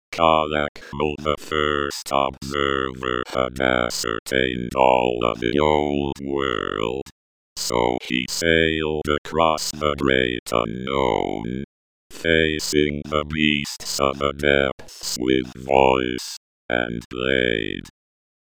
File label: CACOPHONY